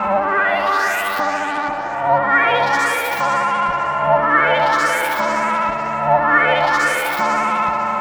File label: Synth Pattern; Patterns Progressions; Electrocution